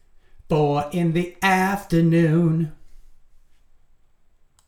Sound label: vocals